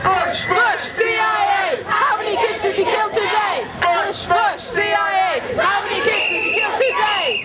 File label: chant; CIA